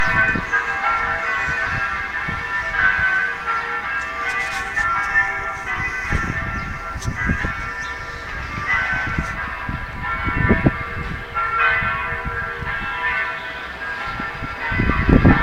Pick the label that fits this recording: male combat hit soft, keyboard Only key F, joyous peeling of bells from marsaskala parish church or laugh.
joyous peeling of bells from marsaskala parish church